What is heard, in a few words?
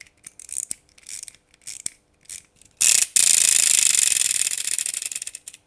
toy; Golden Crayon Sounds